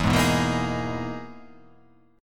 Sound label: E-Major Sharp 11th E